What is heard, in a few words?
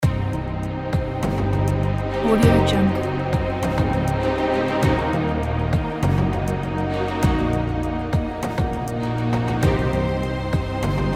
dark dungeon game loop